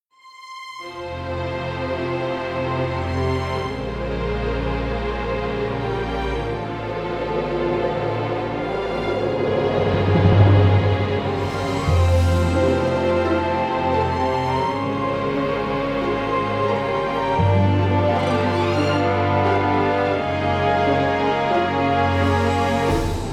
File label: loop close call; final cut pro